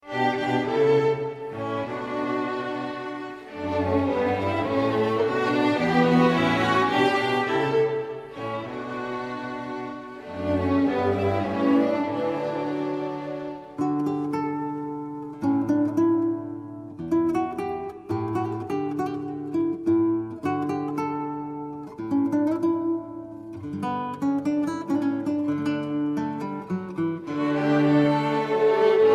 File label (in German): Gitarrenkonzert in D, Allegretto; Instrumentalkompositionen; Erster Satz